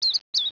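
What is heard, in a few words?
bird; World Racing 2; XBOX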